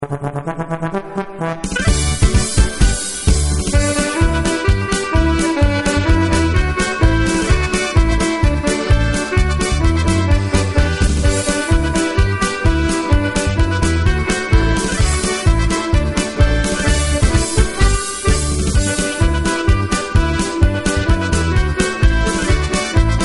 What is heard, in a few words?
polka